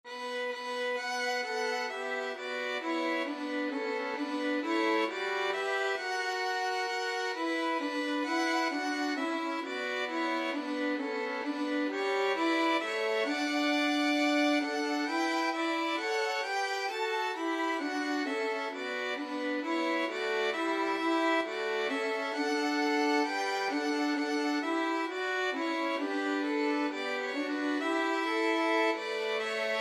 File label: violin trio; god rest